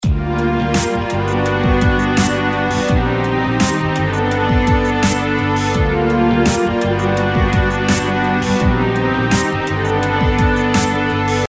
filter effect

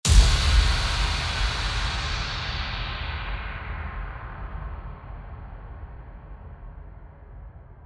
Impact

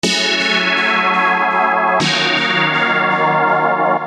arp

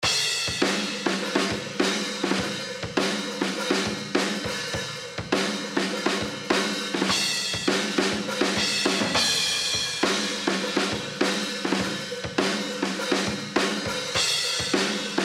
drums amb after

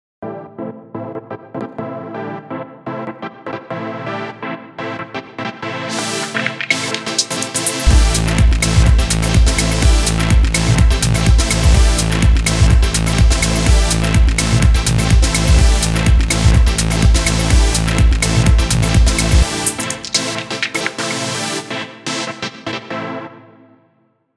super saw

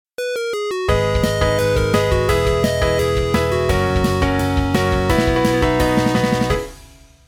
Piano

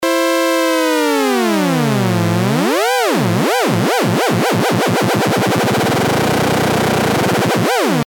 lfo

